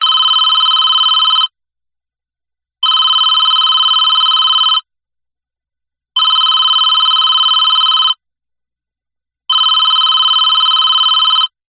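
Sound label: Telephone 4 Rings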